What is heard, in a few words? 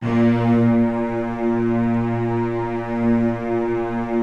Virtuoso Strings, A#1; ILIO; Kirk Hunter Virtuoso Strings VOL-2; 90 s Sample CDs